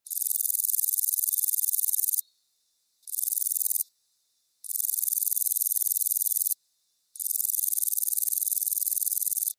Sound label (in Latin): Bicolorana bicolor; BIOAC ORTH